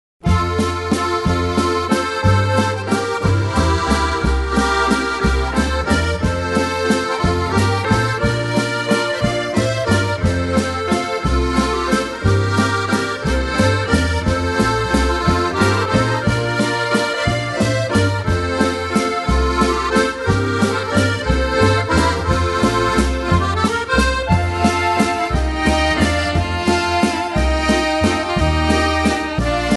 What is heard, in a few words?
Button Box Group Waltz Medley